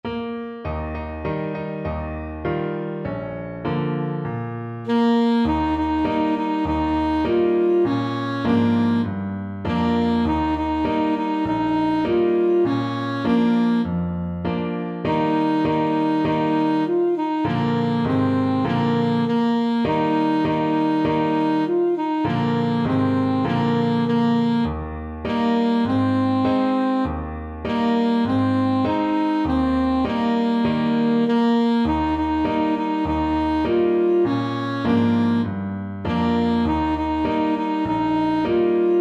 kee chee, saxophone

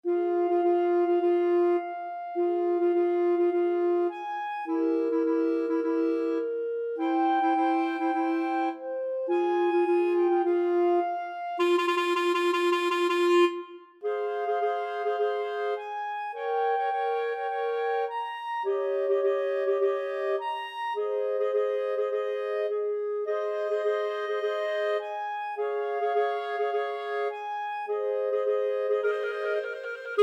fourseasons clarinet trio

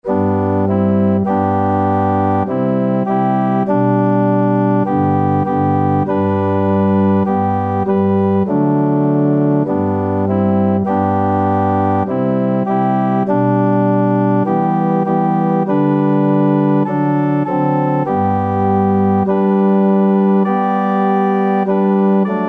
firm foundation organ

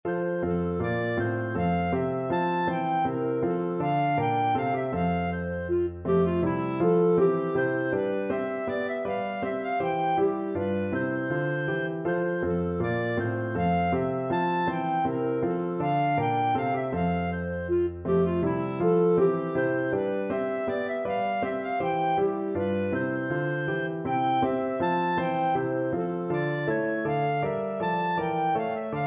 clarinet; handel water bouree

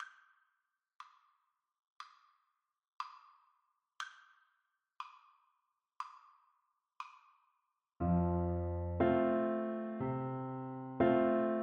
merrily we roll, flute

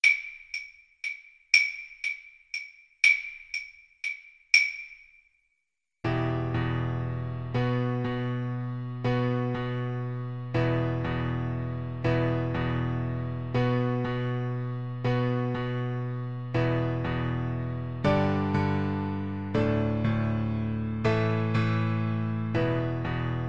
pirates, Viola

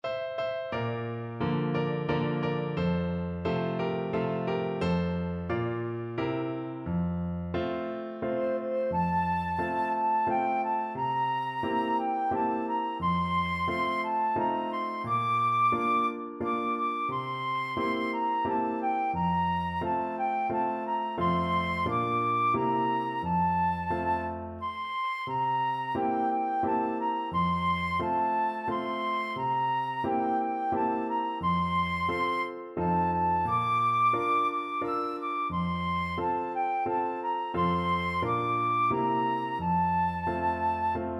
flute

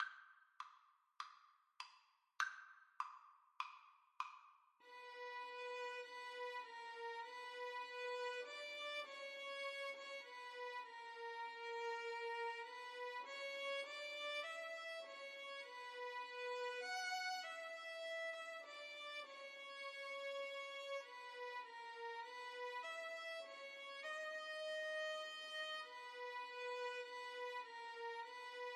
carol violin duet

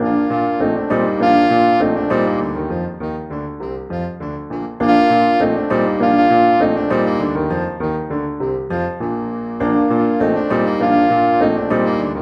saxophone

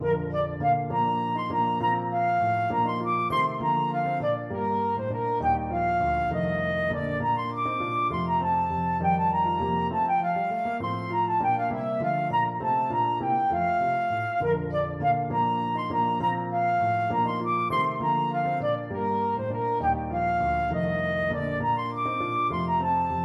handel suite gig, flute

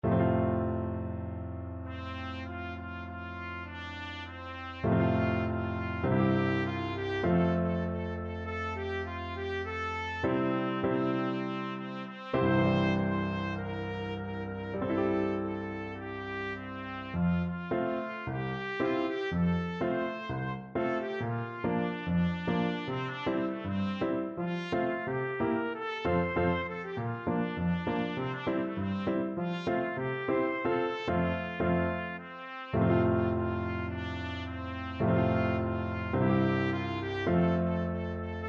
trumpet

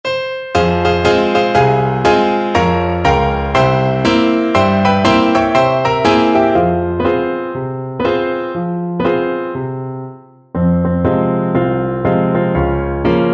Saxophone

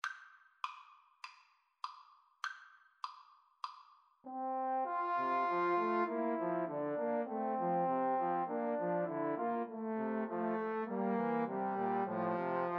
Trombone Trio

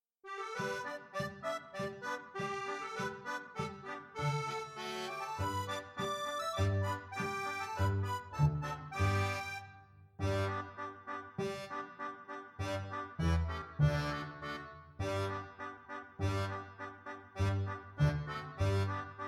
guitar

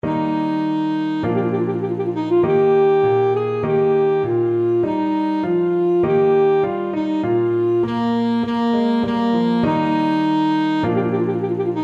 trumpet voluntary, saxophone

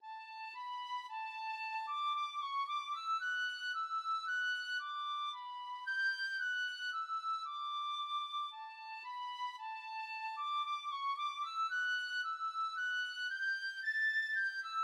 tin whistle

